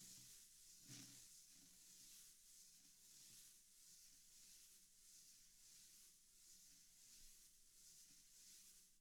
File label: TAMA Drums; ILIO; Double Platinum Drums 1